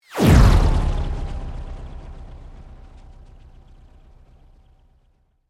Sweep Mechanical Medium; Created sweeps, swooshes and other movement effects; Sweepers; Foundation4

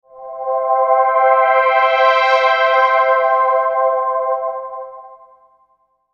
Sweeper Vox Pad; Production Element Imaging Element Accent Transition; Sweeper Sound Effects

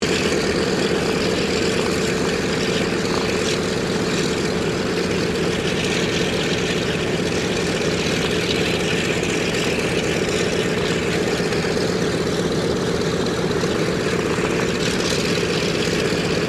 AIRCRAFT DOUGLAS DC3 IDLE; Qantas 1942 DC3; Airplane; Airplanes; Vehicles

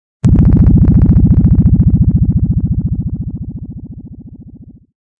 Bass Ringer

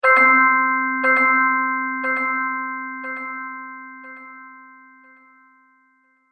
Ta-Da Chimes With Delay; Cartoon Music

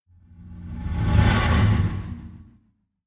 Whoosh Transition SciFi Air Lock Open Resonant; Scifi Science Fiction; Sound Design; Whooshes

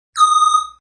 Zinger; Zingers; Multimedia

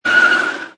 Car Or Motorcycle Brake, Tyre Screech; Tyre screech. Auto, car motorcycle or motorbike braking, accelerate or steering screeching sound.

